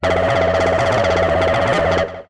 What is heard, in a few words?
Synth Mono; TSMtoolbox